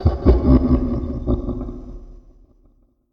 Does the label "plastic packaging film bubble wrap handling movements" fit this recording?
No